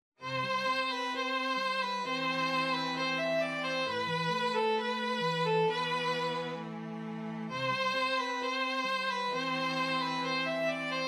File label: Indian song STRING DUO